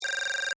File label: Data Text Typing, Beep, Readout, Scifi; Tech